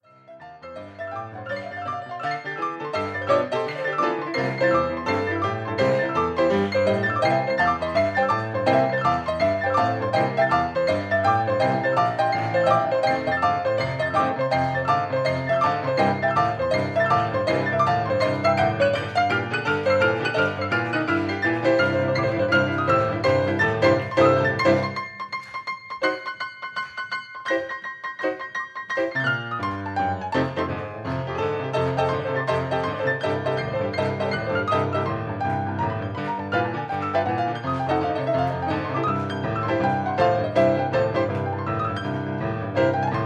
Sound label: boogie, pianoboogie, concert centre culturel